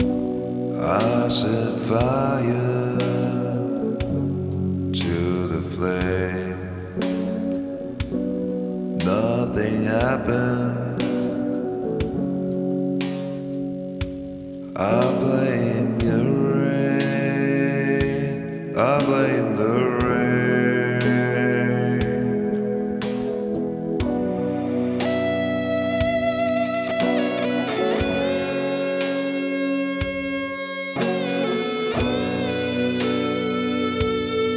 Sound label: Piano